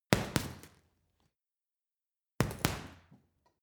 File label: boxing punching bag hit double hard; sport-freizeit; boxen